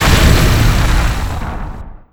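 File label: Laser Hit Interior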